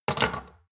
shot glasses place multiple medium; berufe; gastronomie; soundpool

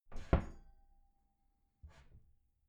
wooden door close soft glass clang

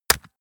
gun shot silencer wrist; schusswaffen

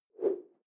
whoosh simple short tonal; swooshes-swishes

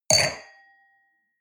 wine glass place table hard, gastronomie; berufe; soundpool

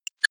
click digital; maschinen; soundpool

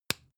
spice jar smack close soft; industrie wirtschaft; gastronomie

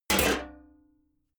sheet clank damped

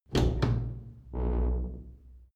old door open

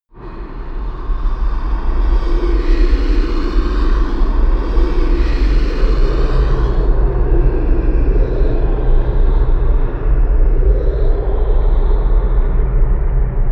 atmosphere voices from hell, Horror

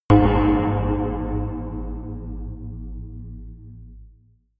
melodic big metal; hits explosionen

